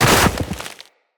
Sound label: Operator Land Soft Deep Snow, Footsteps; Characters